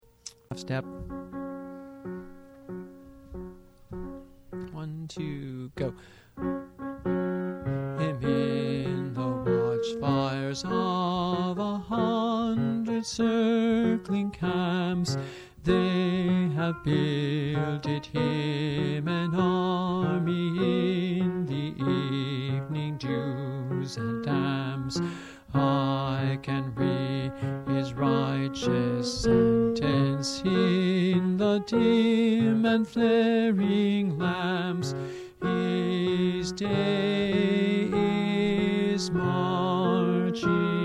Battle Hymn - Soprano and Alto, Mixed Choir Practice; Page 5, Number 5; District II 2003